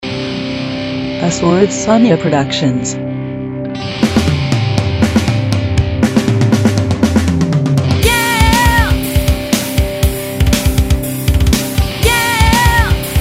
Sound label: Rock; Freak [FEM]